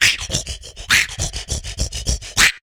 VOC DRUM, MOUTH PERC; Best Service Hyper Dance

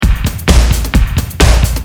Hard, Drums Perc; Industrial

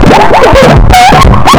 vox FX; Big Beat Samples & More

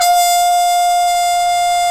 BRS TRUMPETS, Roland LA

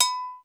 Lo Agogo, Alesis D4

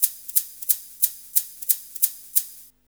PERC LP; Hip Hop R n B; AKAI; USB Soundscan vol.19

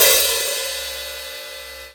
HH OP, Drum Dry; East Collexion; Drum 1

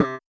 RX8 Bass, Yamaha RX-8

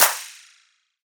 Perc; M8; Electronisounds

